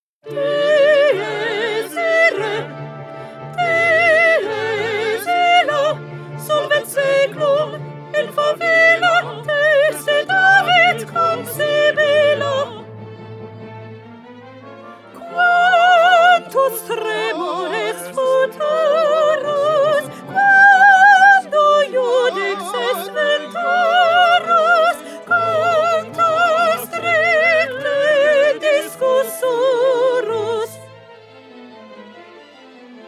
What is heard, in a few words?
Dies Irae, Soprano; 2020 Mozart Requiem